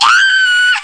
scream

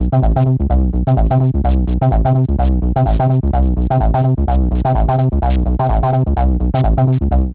hihat low

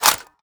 mag out, ak74; guns; cof

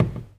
button rollover

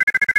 code lock denied

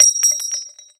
drop; m203; ins2

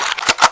gun cock

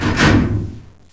elevator; caged

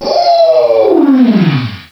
oof

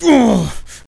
player pain

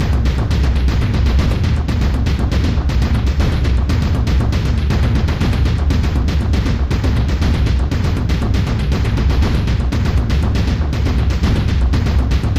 boss loop; chungo

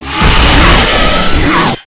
attack, enemies; zelda; gohma

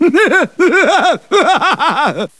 muhehe

